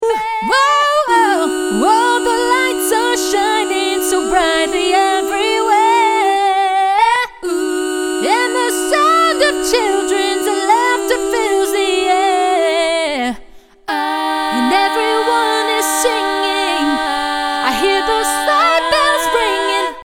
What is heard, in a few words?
vocals